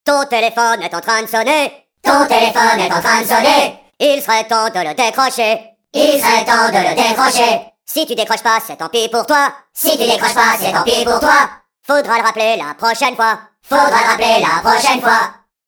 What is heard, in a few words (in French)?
capitaine alerte